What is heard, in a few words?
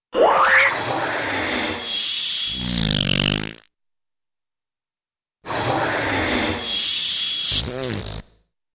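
Big Inhale & Blow Into Balloon with weird Spacey Sounds, Cartoon; Hollywood Edge; Cartoon Trax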